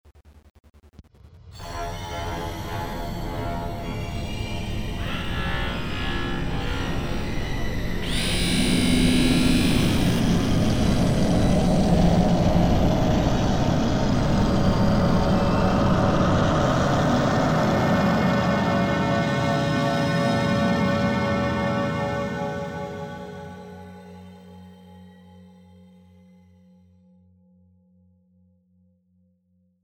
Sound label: Horror Sting